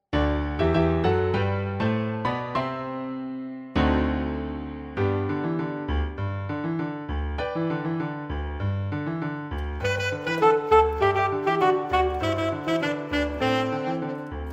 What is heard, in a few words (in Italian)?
ritmi latini x sax, ritmi latini per sax alto e pianoforte